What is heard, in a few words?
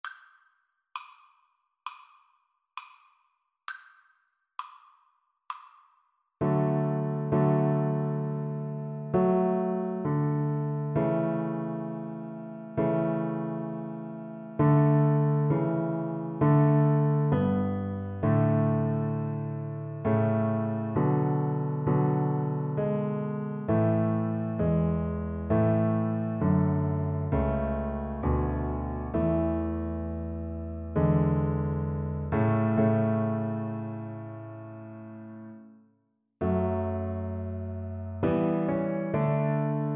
o come, Double Bass